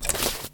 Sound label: Clothes Taken; Atelier